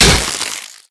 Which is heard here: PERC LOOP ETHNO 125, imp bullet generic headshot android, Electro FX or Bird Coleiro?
imp bullet generic headshot android